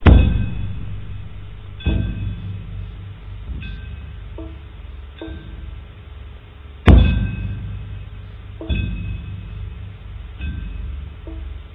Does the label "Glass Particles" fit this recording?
No